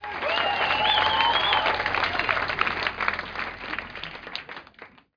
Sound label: crowd birdie win